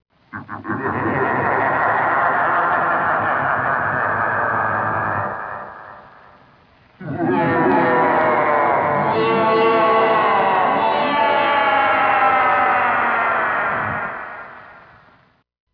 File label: song